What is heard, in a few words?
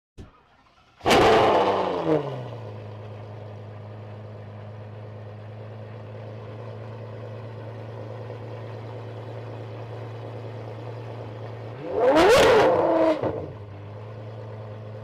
De Tomaso P72